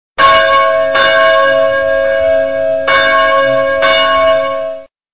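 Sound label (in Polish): Dzwony Kościelne; dzwonek na telefon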